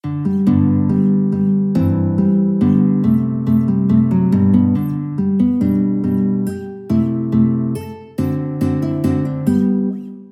Deutscher Tanz, guitar

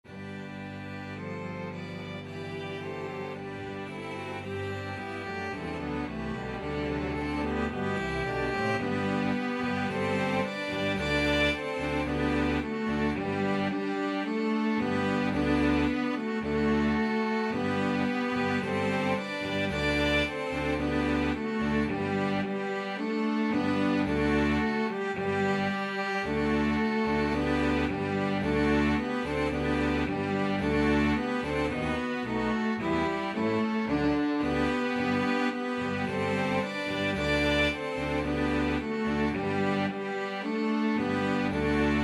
ode to joy, flexible mixed ensemble

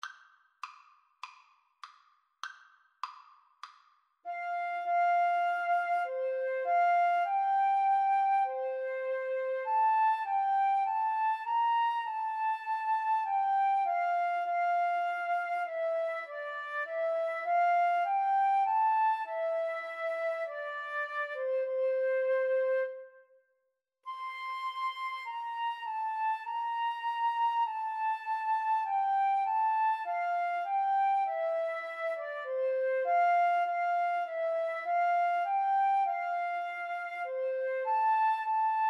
Flute Clarinet duet

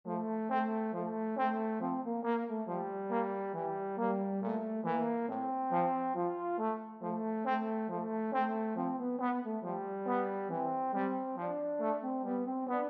rose trombone

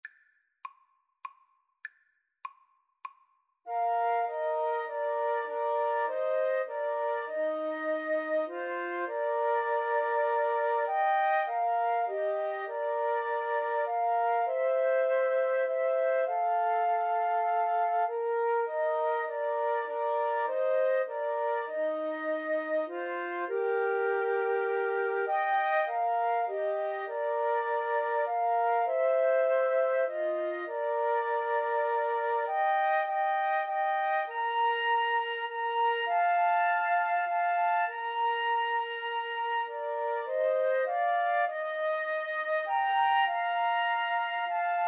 faith of our fathers, Flute Trio

